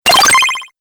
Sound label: Arcade 8Bit Robot Fx, video game